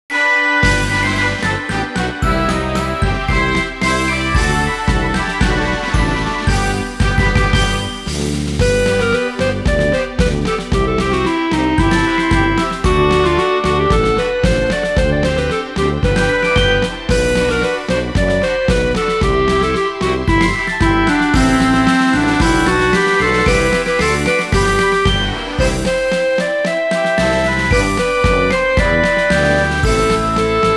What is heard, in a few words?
Academy Anthem (Instrumental); Saint Tachibana Academy